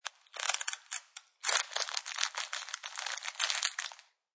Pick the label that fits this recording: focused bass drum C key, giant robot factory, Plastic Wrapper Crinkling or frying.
Plastic Wrapper Crinkling